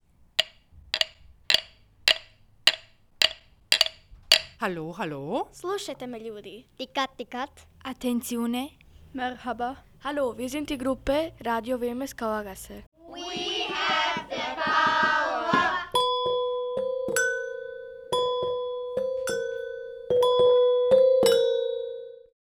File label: jingle; Kauergasse; Radio Kauergasse; Aktueller Jingle; Radiosendungen